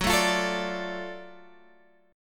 Gb Minor Major 7th Sharp 5th Gb down Guitar Half Step Down